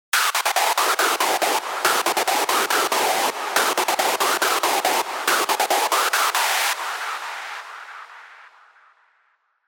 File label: screech; Hazmat Lead; Revealed Serum 2 Screech Pathogen